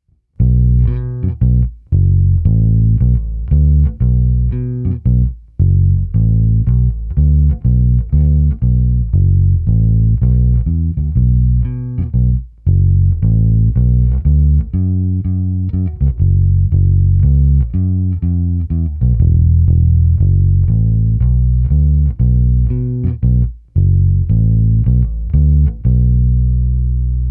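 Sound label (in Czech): Bonusová ukázka RW hraná ve stejném pořadí poloh jako výše, Baskytara; Lakland 44-64; kompresorem TC Electronic SpectraComp a preampem Darkglass Alpha Omega Ultra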